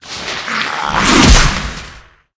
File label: dark phalanx attack